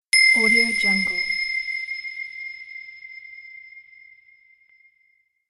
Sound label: bells sound; ib music